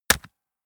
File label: gun shot silencer wrist; schusswaffen